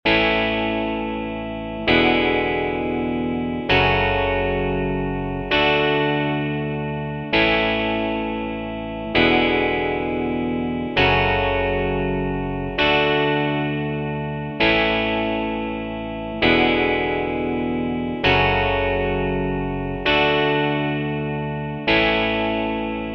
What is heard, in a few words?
Fast Rock No Drums; Tryout track; 2027 MMEA conference All-State Collective Ensemble audition